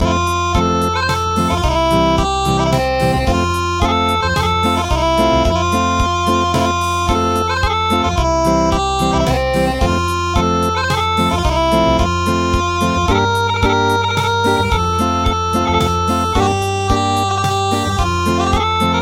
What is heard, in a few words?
Acoustic; Hooray For You Who Turn Your Year